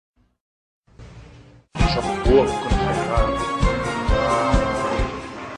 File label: ringtone